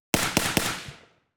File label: AKM 7.62x39mm left on ground Triple shots; 1m; DPA4062